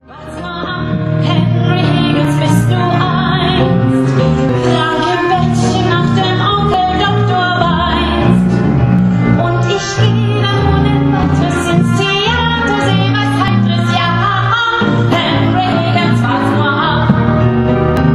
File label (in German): Gesangsprobe; My Fair Lady